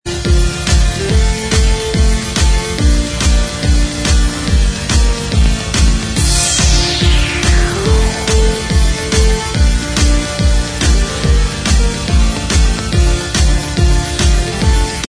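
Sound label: instrumental, backing track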